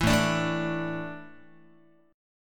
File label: A Suspended 4th, Guitar; Asus4 strummed; JGuitar